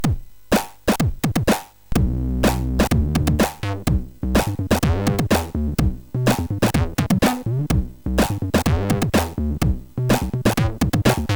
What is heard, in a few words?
Dynamic Rythms, sid MOS6581R4